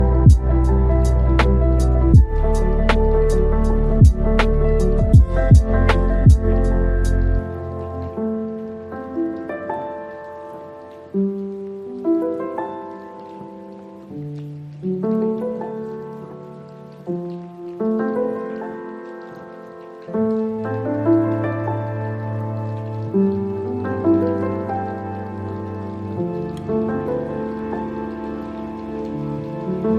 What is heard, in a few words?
jazz loop; Music